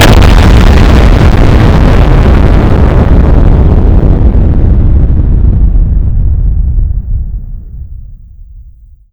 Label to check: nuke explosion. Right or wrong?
Right